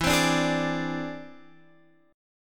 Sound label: E Augmented 7th E down Guitar Half Step Down; E+7